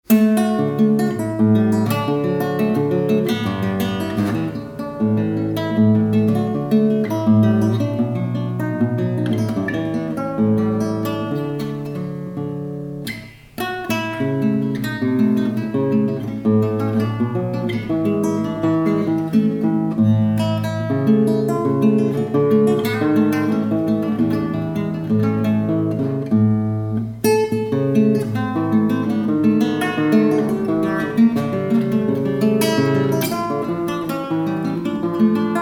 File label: study in D; classical